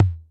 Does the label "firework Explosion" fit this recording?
No